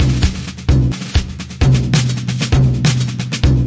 131 double percussion big drum, drum loops and breaks